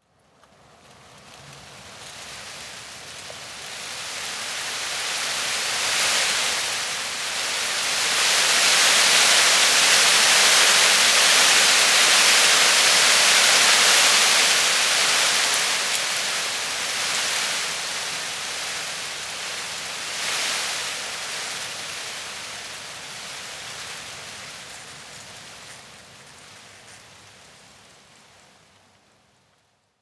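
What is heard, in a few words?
tree leaves rustle heavy, garten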